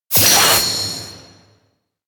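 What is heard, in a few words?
electronic qpyin idea; anemoi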